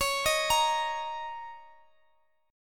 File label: A sharp Minor Double Flat 5th, C sharp